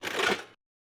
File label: spin Start; netent